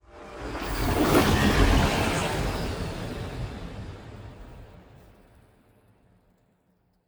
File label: ship titan move; Stellaris; machineage; ships